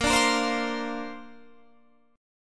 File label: Bb Major Add 9th, Bb, down, Guitar, Standard; strummed; JGuitar